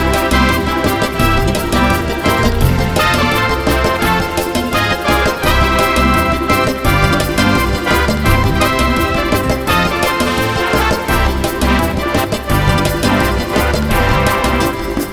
Quick and Hot Banda

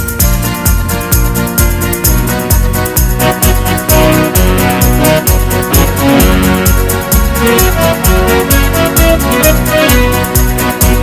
A German Happy Polka

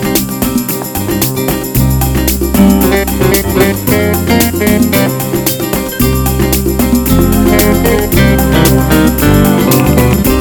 Fast Flamenco

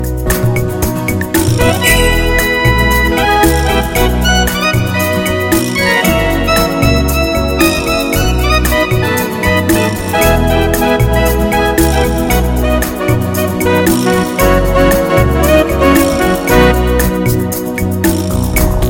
A Pretty Ballad

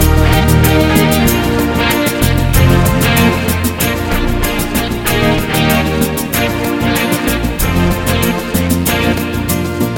Slow and Tuneful

